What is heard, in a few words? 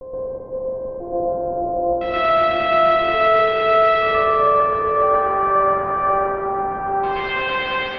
Synth Textures